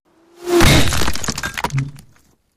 Bullet Impacts Hitting Masonry With Whir In And Debris